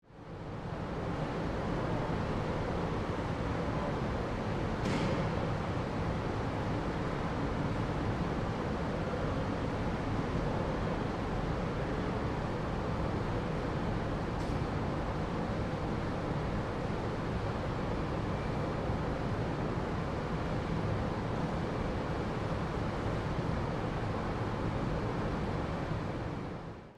Airport ambience empty observation deck

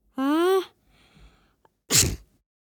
Cute kid sneeze boy child

